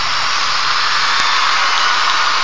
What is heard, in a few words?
crowd; Groovy Bytes: Behind the Moon; VOCALS